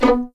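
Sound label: B3 pizzicato MF, violins; East West; The Ultimate String Collection; VLNS PIZZ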